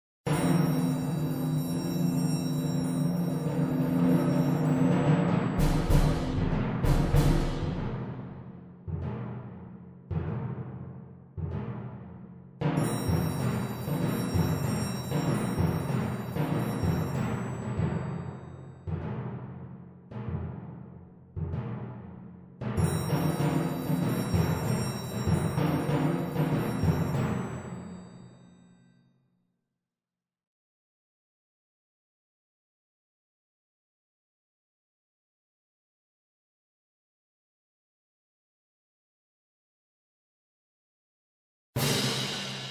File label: volume percussion